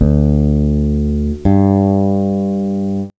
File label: Bass, Drum; Israfil